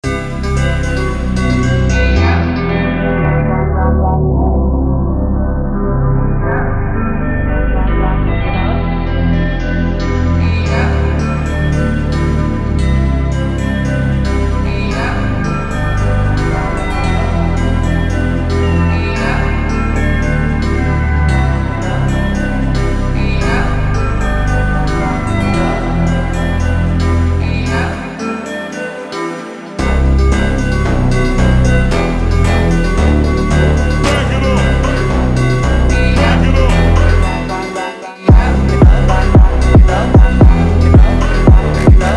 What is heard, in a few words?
Slowed + Reverb, phonk